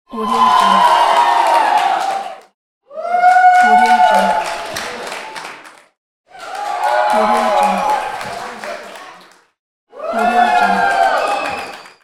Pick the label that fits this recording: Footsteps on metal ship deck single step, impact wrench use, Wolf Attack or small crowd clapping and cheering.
small crowd clapping and cheering